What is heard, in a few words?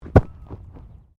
Body movement Fall Medium